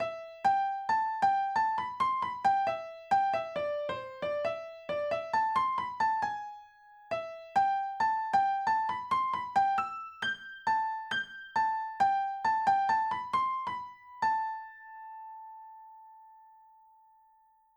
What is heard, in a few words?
melody